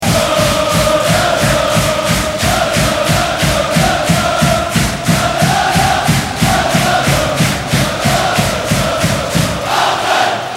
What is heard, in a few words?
Laa Lalalaaa